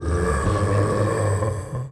idle, male zombie; nmrih; zerosiki; slender

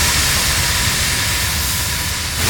portal loop; potry v2; saxton hale

